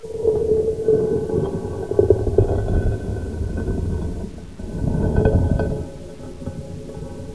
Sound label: door move; gearbox